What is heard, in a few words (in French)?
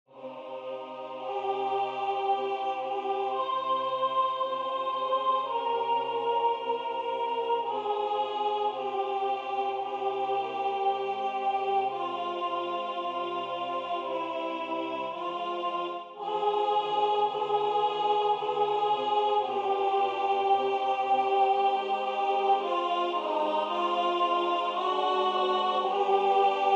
soprane; Adoramus te Christe; Gasparini